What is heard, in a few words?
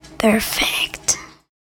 perfect; Frets on Fire